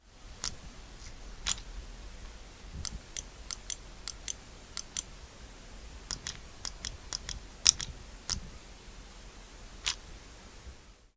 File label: click